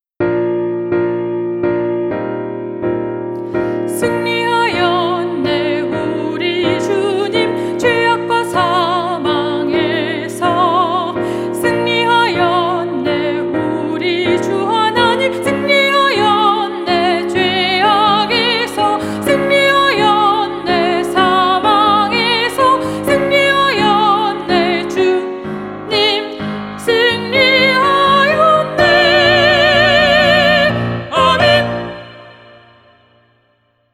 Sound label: SOPRANO